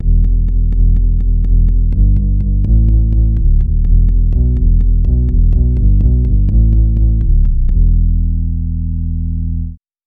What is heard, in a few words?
synth bass